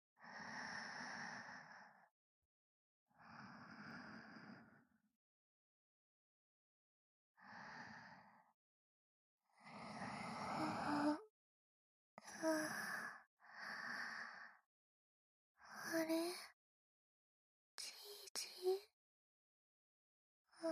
耳かき

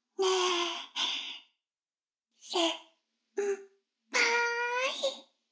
耳舐め音声(LR逆ver); 逆時間停止されて

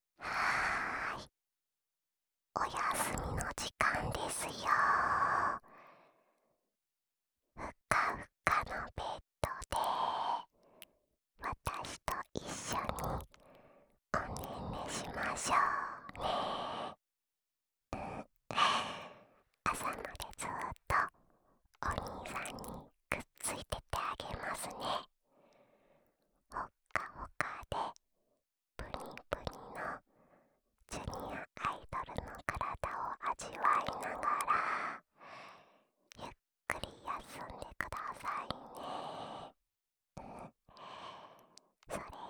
吐息たっぷりの添い寝ループ L, ASMR

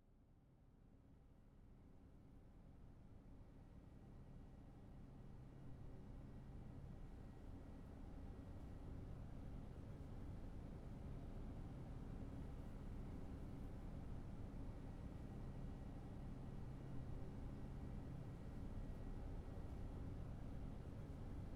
耳かき屋さん、右耳体験, ASMR; ASMRver

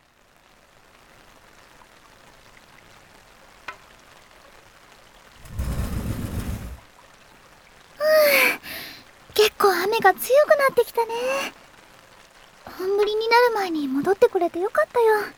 耳舐め, ASMR; 暗闇のドキドキ耳舐め

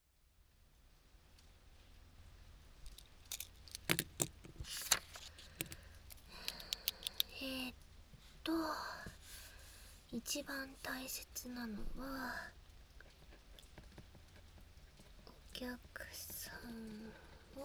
お耳かきかき 雨音 有り

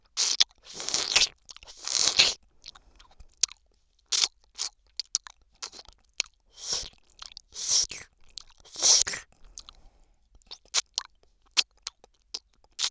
左耳アイス舐め

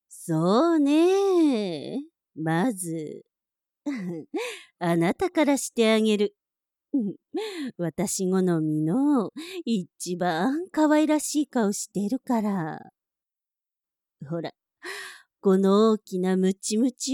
フェラチオ (少年声なし)

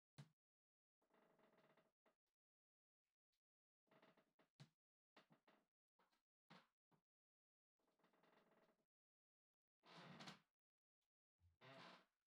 床の軋み

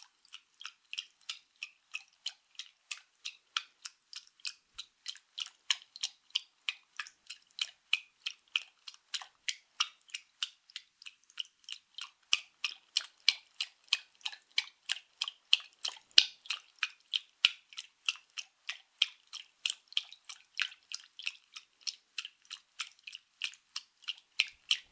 パンパン+空気音 中, 水音効果音

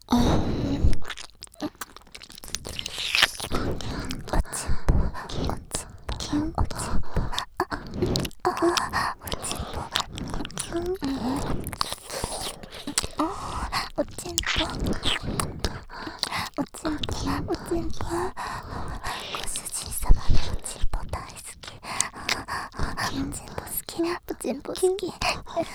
耳舐め喘ぎ; お屋敷メイドのハーレム淫語ヘヴン 気が済むまで耳舐め淫語を堪能できるバイノーラルオナサポ音声; 静華＋

